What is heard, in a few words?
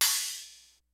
HATS CYMBALS; Kid Nepro; Elite